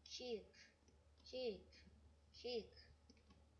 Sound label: kick